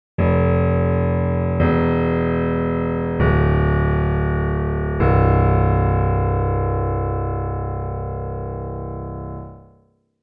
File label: inharm high